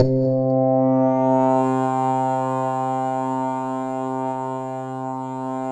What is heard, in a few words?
PHA, synthesizer bass; Synthesizers; Soli-Music; World of Synthesizers VOL-1